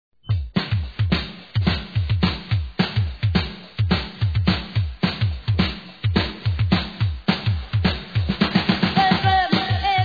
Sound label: The Drum Is Everything